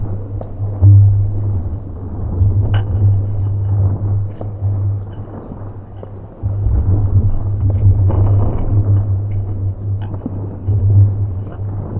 elder tiyanki combat idle; Stellaris; distant stars

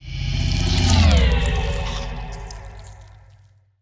ant ship juggernaut move; biogenesis

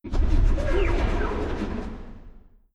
distance auto cannon hit small; weapons; Stellaris

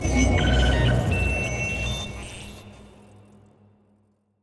ai planet, ambient; Stellaris

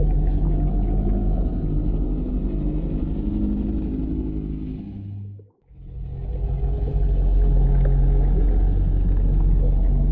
void worms juvenile idle; Stellaris; grand archive; ships

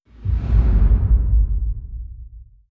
distance god ray fire; apocalypse

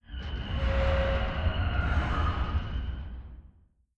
ancient space station death; Stellaris; guardians

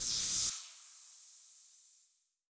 mega shipyard electricity amb; Stellaris; federations

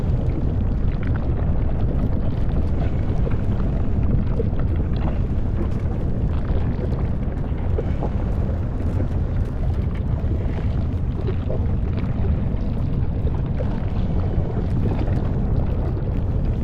void worms nest idle; Stellaris; grand archive; ships

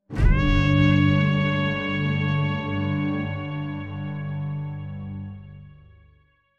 hunter fanfare announcement; Stellaris; grand archive

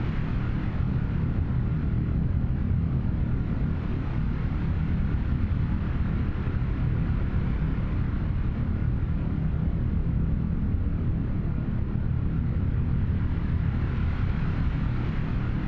ship colony ship idle; Stellaris; machineage

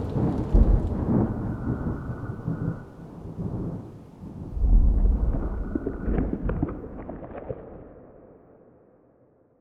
sfx amb vfx thunderclap; ambient; System VFX

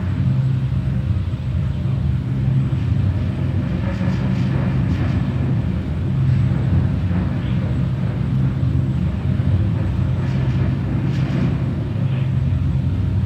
ambient terraforming station idle hum; Stellaris

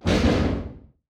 distance swarm scroge missile fire, weapons; Stellaris

